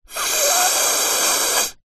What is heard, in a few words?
human snort nose through straw